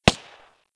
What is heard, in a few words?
Guns End; Playable Tutorial Level